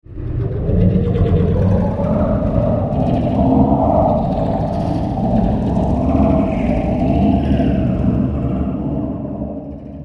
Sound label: Fantasy Forest Winds; Wind Sound Effects